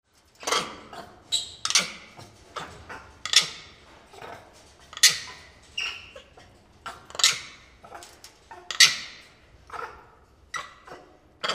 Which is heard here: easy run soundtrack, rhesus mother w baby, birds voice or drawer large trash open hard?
rhesus mother w baby